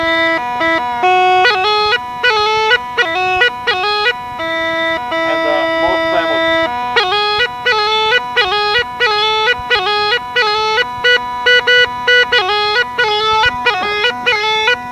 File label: song in 2 4 + Sitnije, Rodope Gajada